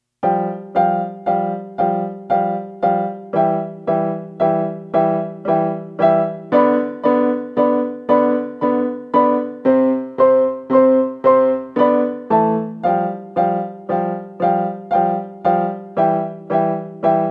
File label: Duet Chopsticks